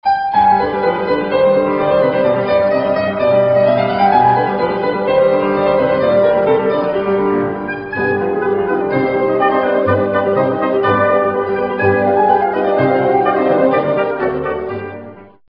piano orchestre; Famille des instruments à cordes frappées

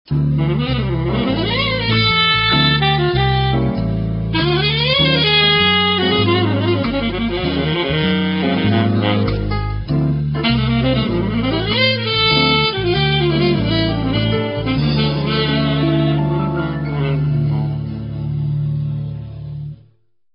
saxophone jazz; Instrument à vent inventé en 1843 par Adolphe Sax. Constitué d'un tube en cuivre

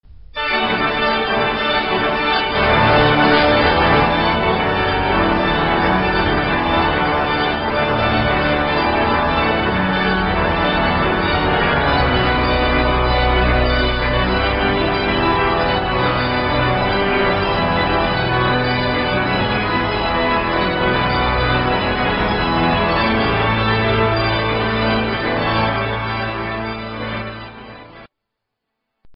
orgue; vierne

